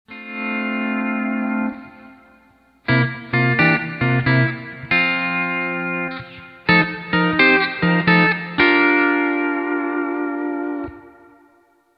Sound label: D13 Tab P6,RKR,LKV